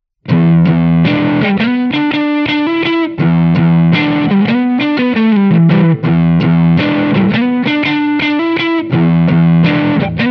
crunch both neck parallel bridge series, drive – both pickups with neck-PU in parallel; Manne Ventura